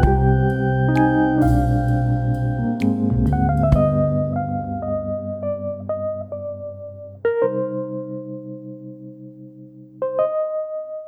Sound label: Chillout; BB 2HTC Samples Vol 4